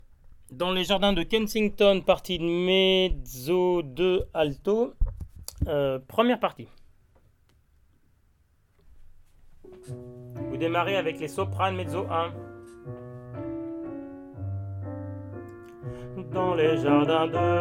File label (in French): Alto